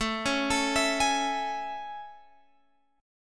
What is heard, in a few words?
A Major 7th A, Guitar, Open G